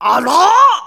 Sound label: Bloat; Oren bloating; zelda